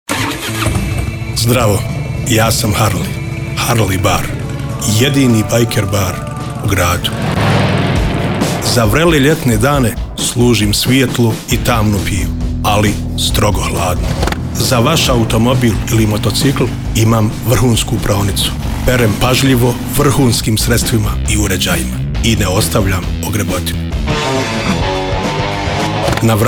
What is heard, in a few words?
Harley BAR